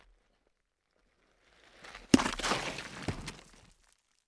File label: Boulder fall